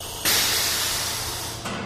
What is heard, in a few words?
Jpl 70 Space Sim Air R, pneumatic